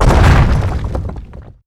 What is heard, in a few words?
rolling boulder stop; weapons; dota; earth spirit